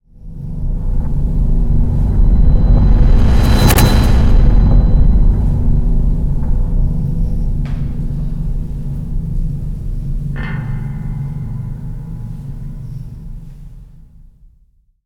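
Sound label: forward reverse, Cinematic Metal Impacts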